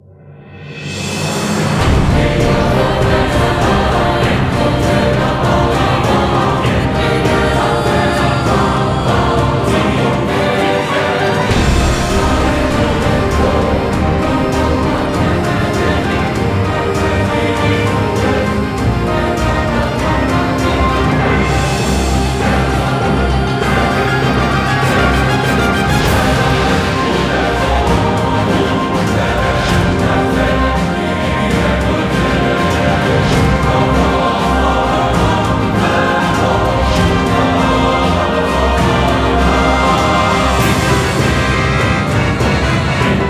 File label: bgm boss; Fire Emblem Awakening; 3DS